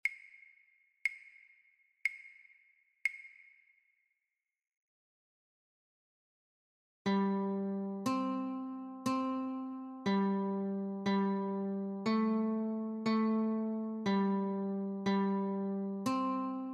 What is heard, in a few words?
Notes only, Guitar